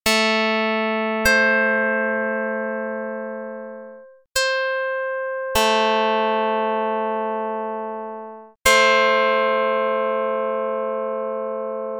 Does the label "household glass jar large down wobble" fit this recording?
No